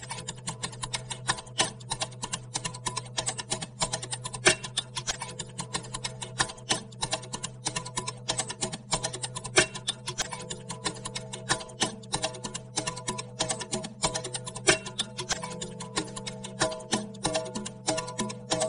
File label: typing to jazz; riffusion